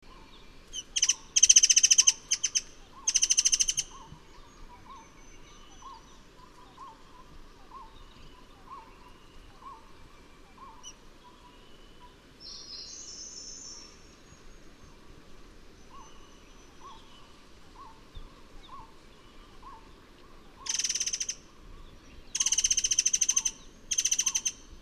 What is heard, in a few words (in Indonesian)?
burung murai besi murai cai murai air mancur